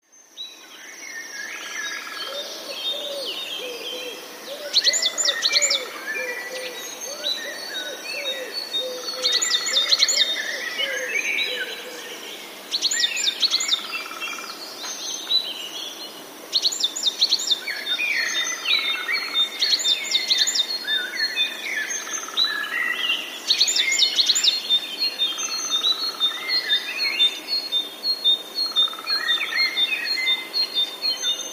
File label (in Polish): bogatka